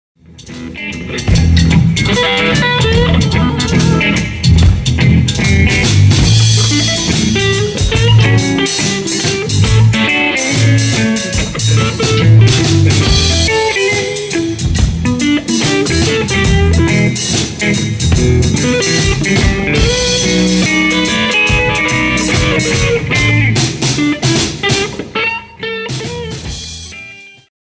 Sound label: Jam Session